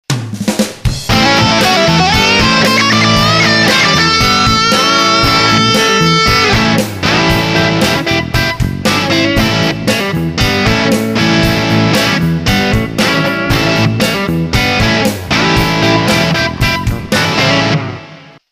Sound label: lick